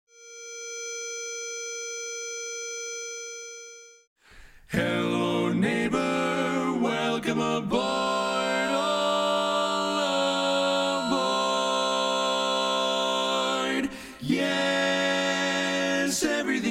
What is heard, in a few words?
Ive Been Working Bari